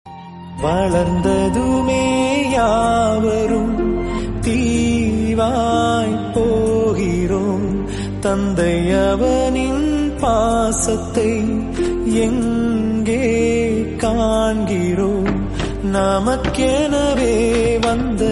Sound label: father emotional song Tamil song